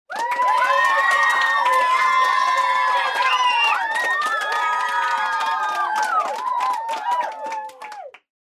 odobrjajushhie aplodismenty i vozglasy detej

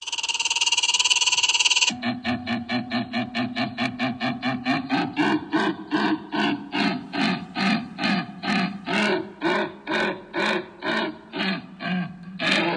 strashnye zvuki ptic

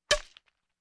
eche udar; Звуки игры в бадминтон

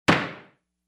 krupnyj plan udar; Звук молотка судьи в зале суда; Есть звуки суда

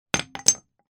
kladut

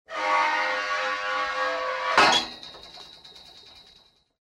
te zhe metallicheskie vorota

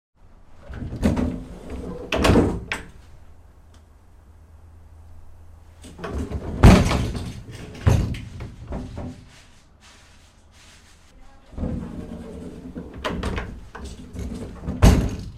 zvuk dveri v kupe

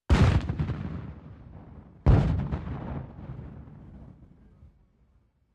Tank vystrely; Танк М1 выстрелы; Звук танка в движении, заводка, старт, остановка внутри и снаружи. Выстрелы танка

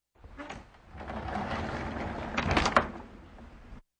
Dver na polozkah

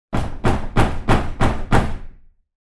kulakom; Звук стука в дверь

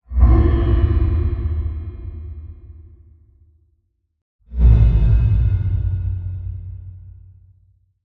Dva zvyka ugrozy

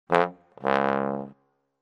sozhalenie tuba

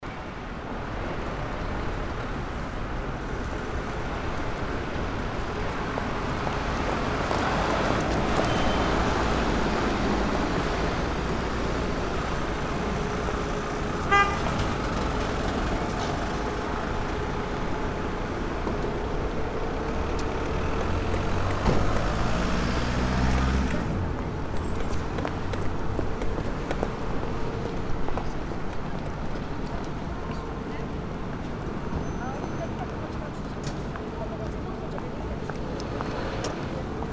s shagami i tramvaem; Звуки города

